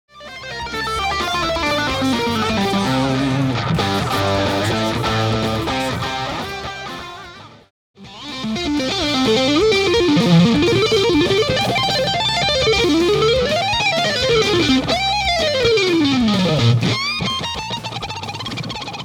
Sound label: Lead Guitar Boosted